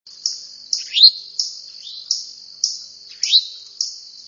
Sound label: towhee; birdsongs